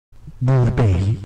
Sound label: burple